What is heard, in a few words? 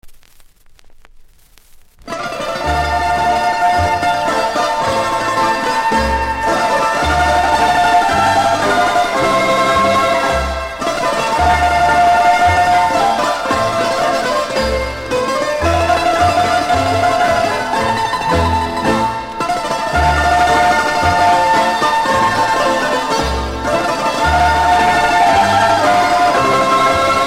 Hasapikos